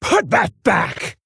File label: Female Orc Attack